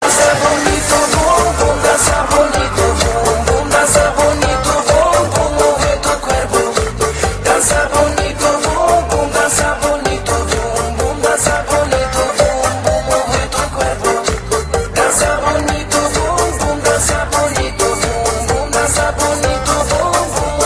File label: Narcotic Sound